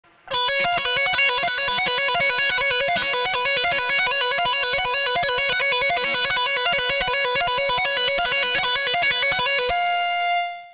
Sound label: tap